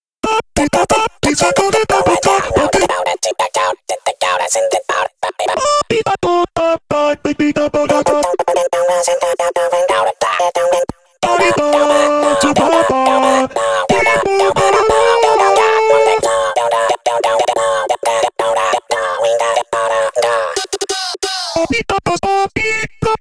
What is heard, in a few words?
Voices